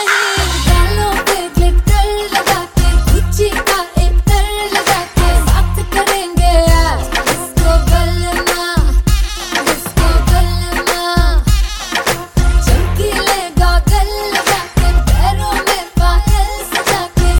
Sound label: new hindi music ringtone